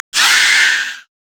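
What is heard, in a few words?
scream; kogmaw; icathian surprise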